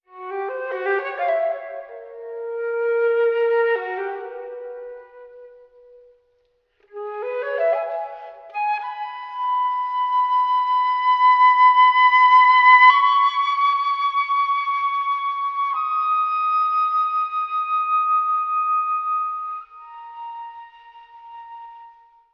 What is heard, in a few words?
flute, Sad